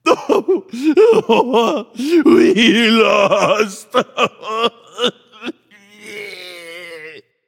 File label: boohoo; danzaburou